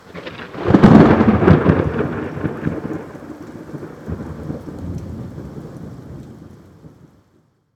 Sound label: Thunderstrike Strong